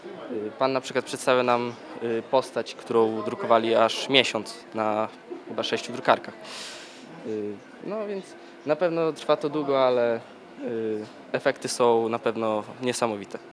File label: techno